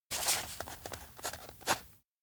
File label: write eval note; rikka; anemoi